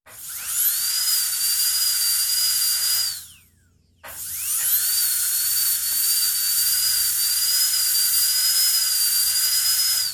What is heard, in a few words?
Dental drill